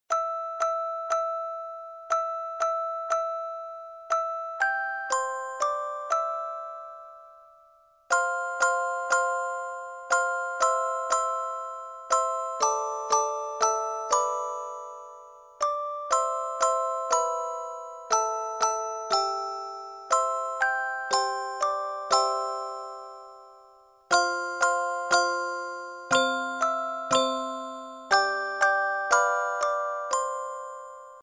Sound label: jingle peace